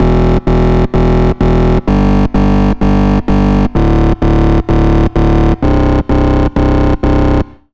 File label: Bass, Spire; Deep Fusion; Routed Sound; Spire Expansion; Star Scavenger Vol.1